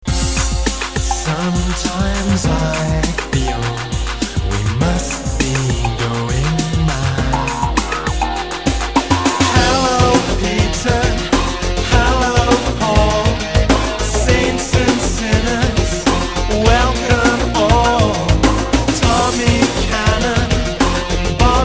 Synthpop Samples

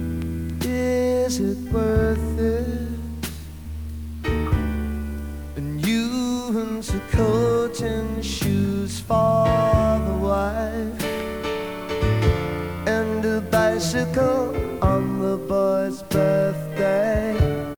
Vocals

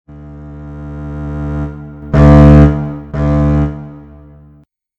Surprise

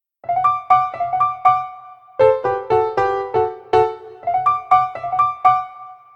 Piano

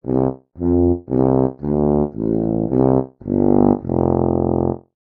papa Tuba

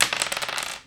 Dice; Board Games; George Karagioules; SFX for Board Games

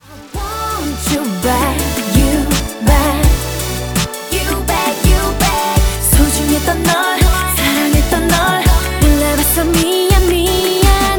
K-Pop

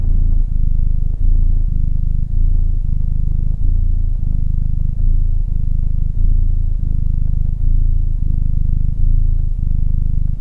Purr loopable

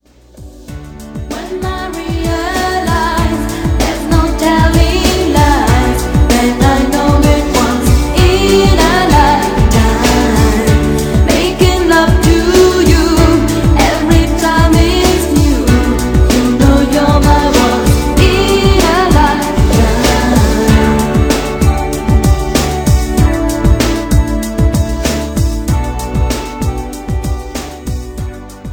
Gregorian

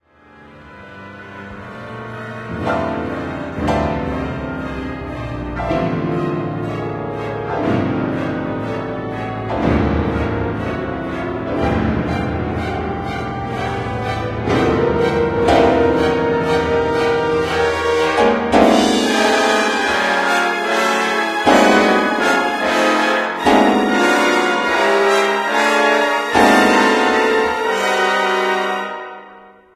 Piano Concerto; Cowell

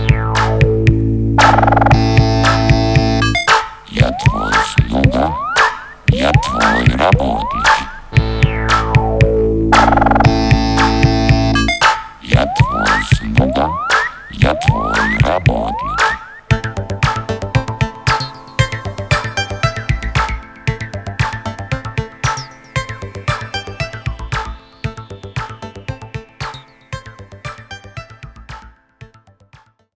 House Synthpop Samples

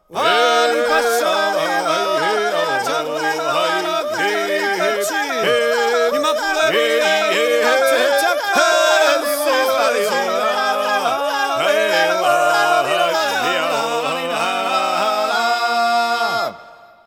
Georgian Voices

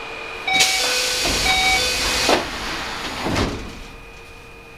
Keio 7103 chime close

